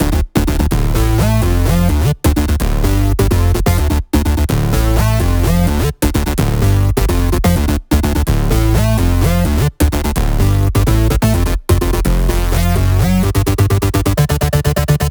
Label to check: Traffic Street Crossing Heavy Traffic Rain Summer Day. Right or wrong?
Wrong